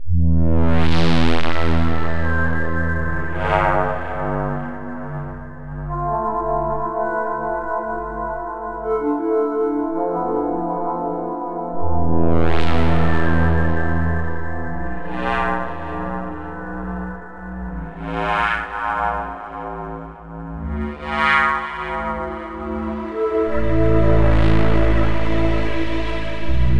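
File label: ambience loop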